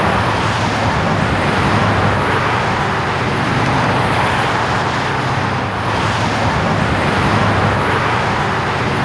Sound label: HIGHWAY 1, TRAFFIC; AKAI S-Series CD-ROM Sound Library VOL-6